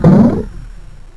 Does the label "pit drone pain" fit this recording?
Yes